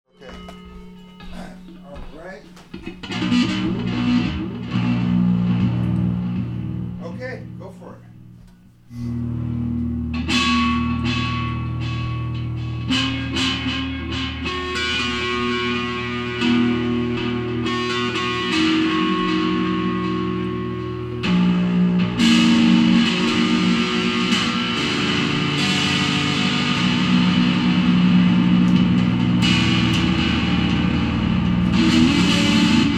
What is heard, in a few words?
fretless